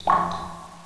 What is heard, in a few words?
drip, ambience